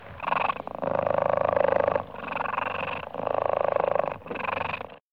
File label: cheetah